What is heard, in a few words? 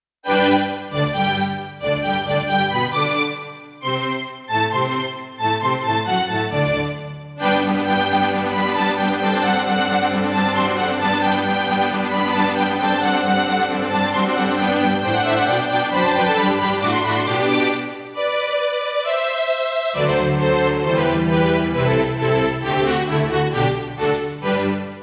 Roland SC-88ST Pro 55 MAP; Mozart - Eine Kleine Nachtmusik partial; classical